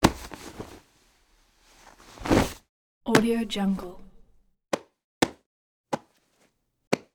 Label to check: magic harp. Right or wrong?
Wrong